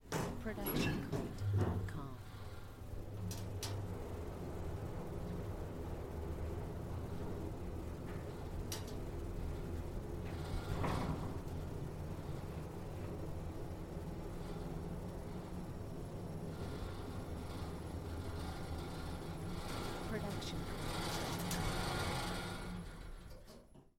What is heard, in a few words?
elevator moving multi floor; soundscrate